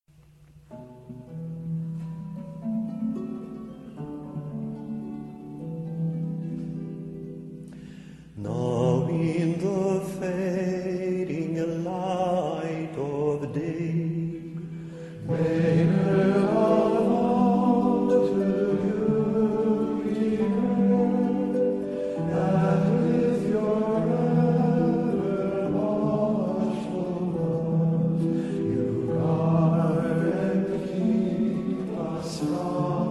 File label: choir